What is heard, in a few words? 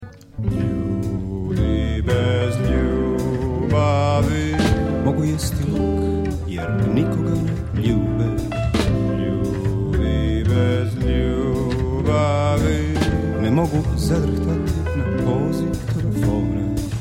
Samba